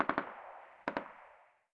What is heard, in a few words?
combat atmosphere; World of Tanks